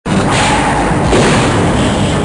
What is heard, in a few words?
fire hit